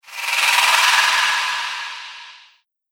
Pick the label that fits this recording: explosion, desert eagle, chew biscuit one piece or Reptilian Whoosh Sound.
Reptilian Whoosh Sound